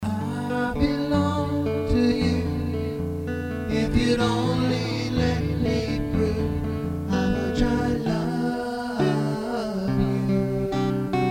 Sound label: ACOUSTIC